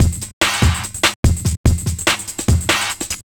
145, Breaks; bootyshorts; neuro; Sinistarr

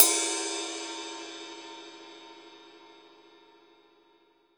sfx perc

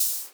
M500 HH, Drums; Best Service Dance Mega Drums; MAESTRO

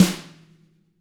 tama hit; ILIO; Double Platinum Drums 1

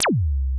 PER, TECHNO PERCS; Crypton - DrumBase5000

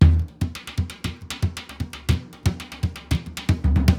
SURDO, MERENGUE; Best Service ProSamples vol.14; AKAI

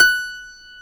PIANO A#4; WS#6 JAZZ

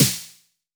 Elec Snare; Snares

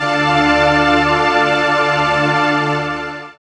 SYN DANCE, Roland; Sound & Vision - Gigapack II

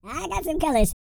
E TALK, Vocal Planet R&B & Dance; ILIO; Vocal Planet VOL-4

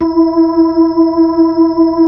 B-3, Brite

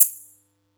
Yamaha EX5, Drums

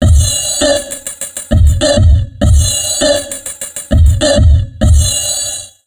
Drum Loops Crazy Processed

